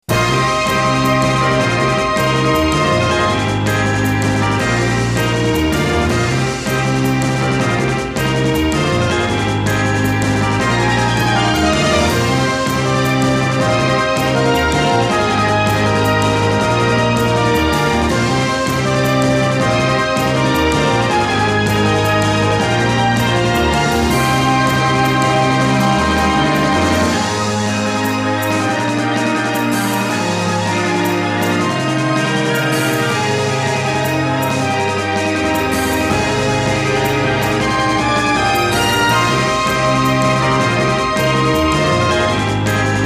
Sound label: Battle